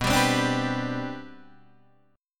G Major Add 11th, B, Gadd11/B chord; strummed

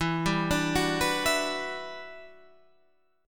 E Minor 9th, down

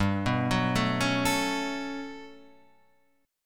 G Major Sharp 11th G down Guitar Half Step Down

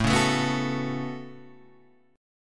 A 6th Add 9th A down Guitar Standard; strummed; capo=4

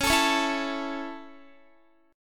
C sharp Minor Sharp 5th down Guitar; C#m#5; strummed

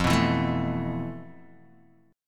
F Major 7th Flat 5th, F, down, Guitar, DADGAD

